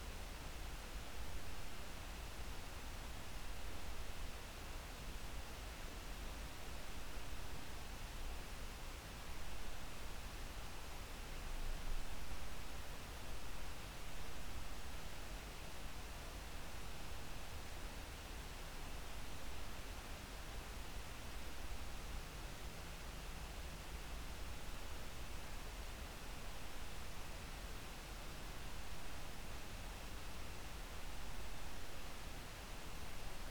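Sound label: hydrophone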